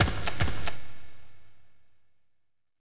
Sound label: Old Fox Run